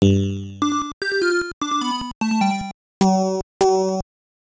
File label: Jingle